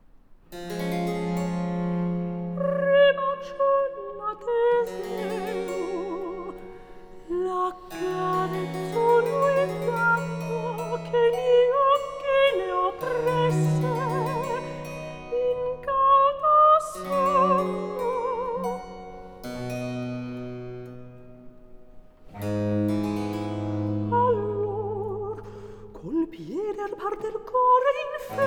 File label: Recitativo; Arianna; L'Arianna (Ebra d'amor fuggia), H. 242; IV. Recitativo Ribaciolla Teseo